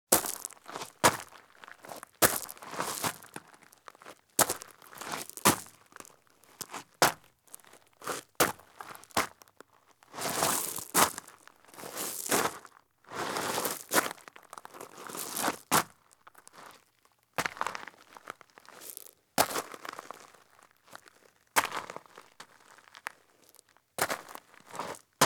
Running Shoes Do Various Scuffs on Rooftop Pea Gravel; Sound Ideas; Footstep Sound Effects